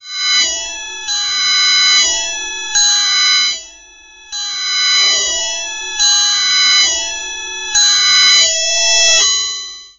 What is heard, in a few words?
sword slash pixel game